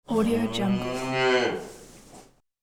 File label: single cow moo; sound-ideas